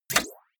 sci-fi click positive light; maschinen; signale; soundpool

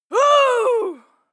female fight attack yell; schreie; menschen

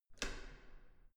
entrance door handle up normal

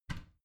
bathroom cabinet warped open heavy; objekte; tueren

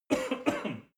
male cough; soundpool

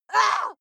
female lunatic shout short; schreie; menschen

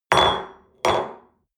espresso upset table hard, restaurant cafe

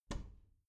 bathroom cabinet door open regular

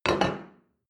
plate place table medium; sport-freizeit; restaurant-cafe

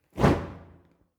fire torch whoosh short; swooshes-swishes

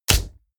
snap

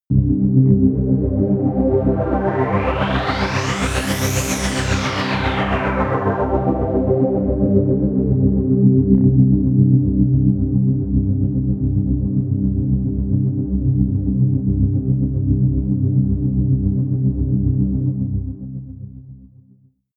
pad sweep wobble; flaechen

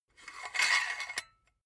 silicone gun empty pusher push slow; berufe; handwerker; soundpool

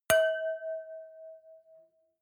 wine glass similar clink; berufe; gastronomie; soundpool

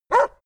border collie bark strained; natur-tiere; hunde

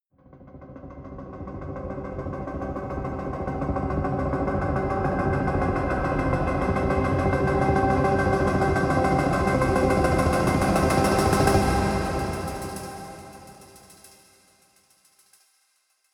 industrial rattle, risers; whooshes rises

